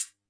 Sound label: classic hh; STYLE KITS (Hip Hop, Etc); Classic Hip Hop Mega Kit